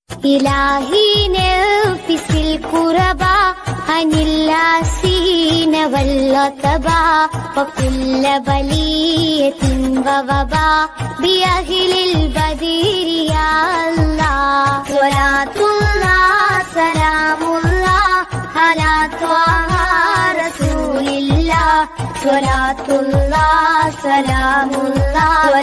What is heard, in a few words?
Arabic naat ringtone, islamic ringtone